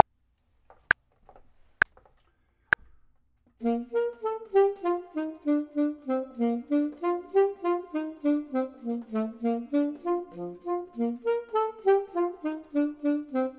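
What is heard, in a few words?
bottom, Saxophone and Clarinet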